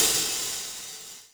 CYMBAL, Yamaha RM 50

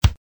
kick

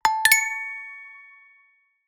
NOKIA ALERT Glass; Nokia Ringtones

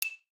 Jazz open; Nokia Ringtones; Audio themes

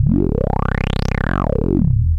resonant acid, audio loops

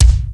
Mondo k, Drum Samples, Roland JD-990

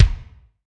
Kick Ambient

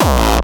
Bassdrum

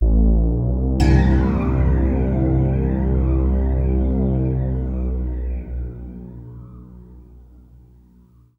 Digital Build; Sound FX

